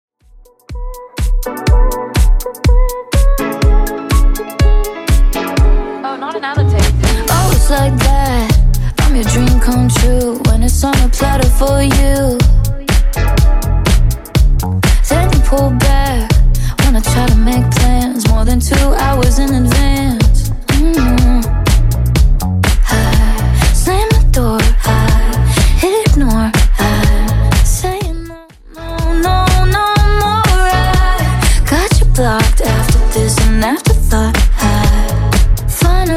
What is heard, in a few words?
Top40 Redrum 123 bpm